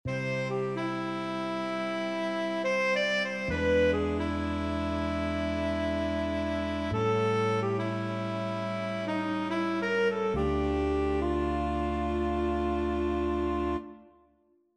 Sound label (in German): Saxophone; Formen lernen